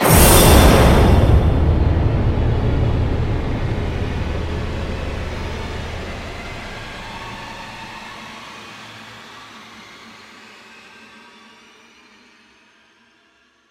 Impact; at dead of night windows gamerip 2020

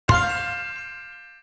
victory medal; Replay VHS Is Not Dead; argent

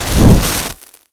candle

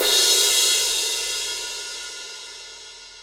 Crash

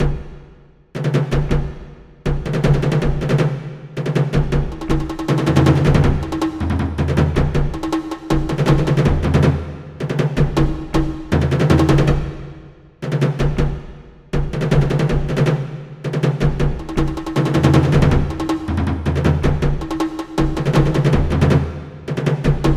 Jungle

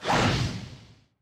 Throw Monster Throw

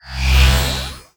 Device Activated; Neutron

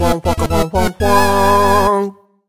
Jingle Levelup; Dandy Dungeon Legend of Brave Yamada

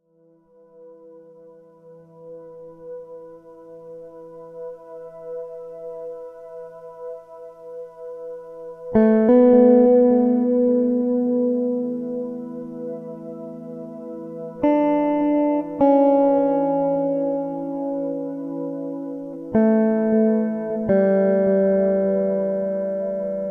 Guitar Start

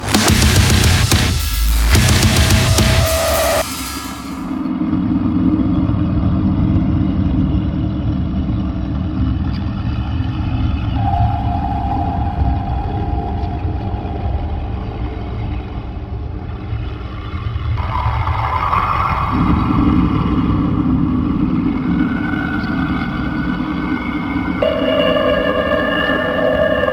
ending; Doom

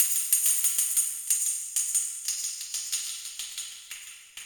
tamb

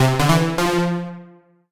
Solo Warm Synth Positive; MUSIC EFFECT; startide

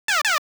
Target get sound; Hopping Mappy

